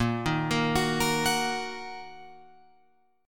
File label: Bb Suspended 4th Sharp 5th Guitar Open F, Bbsus4#5 chord; Bbsus4#5 arpeggiated; 10,10,10,13,13,13